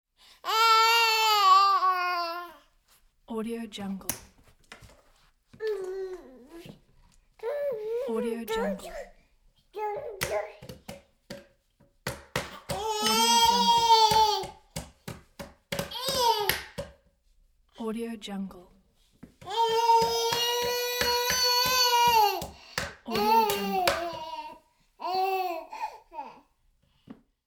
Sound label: one year old babbling beating on chair; applehillstudios